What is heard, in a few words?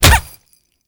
Punch Impact; Warframe; Grineer; Royal Jester; Attack